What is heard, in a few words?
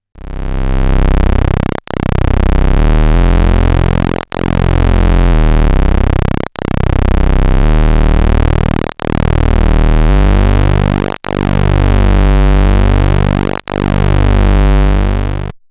square